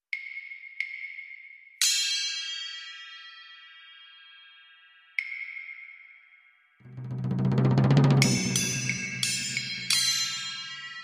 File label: Barking Bells Full